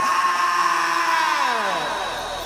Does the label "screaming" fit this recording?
Yes